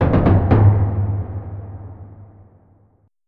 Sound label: wardrum; across the obelisk